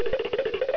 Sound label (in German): Walzenlauf